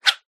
sport fishing rod cast whoosh whipp in air; zapsplat

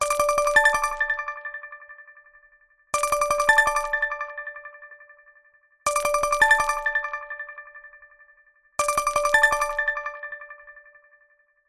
multimedia voip call ring software; zapsplat

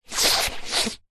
human sniff snotty into hand

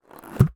cartoon pull stretch release; zapsplat

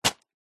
household medicine tablet pack box set down; zapsplat

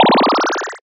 game one up extra life

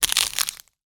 foley footstep on ice; zapsplat

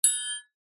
triangle single hit muted; musical

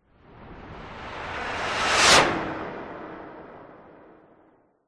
sound design whoosh white noise med whizz

